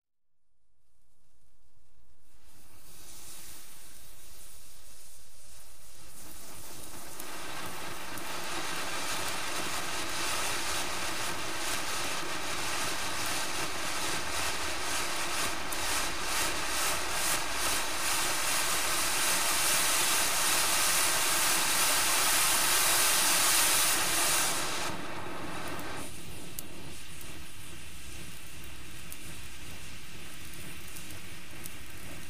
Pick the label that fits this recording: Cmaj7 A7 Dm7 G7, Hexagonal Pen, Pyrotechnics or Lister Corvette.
Pyrotechnics